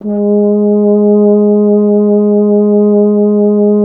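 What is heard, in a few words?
BRS F.HRN, Solo Instruments, French Horn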